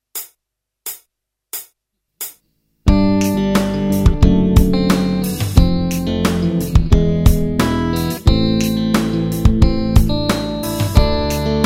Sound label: jam, guitar